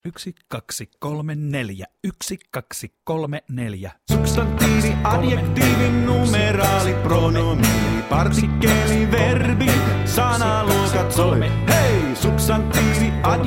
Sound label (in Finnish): lapilasku sanaluokat ilman rumpuja